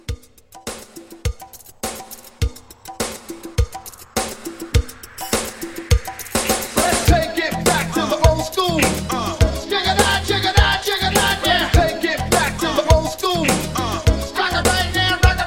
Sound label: Hype Re Drum 103